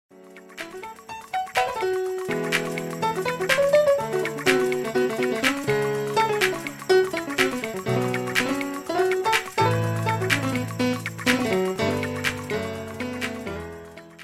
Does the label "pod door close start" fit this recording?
No